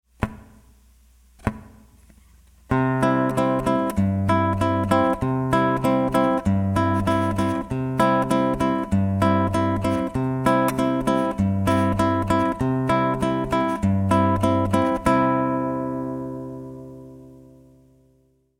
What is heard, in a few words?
wrong choro; brazilian rhythms